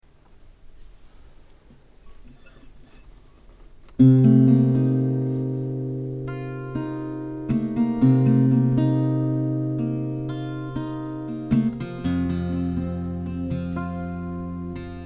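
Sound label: F 120 guitar alternate tuning dry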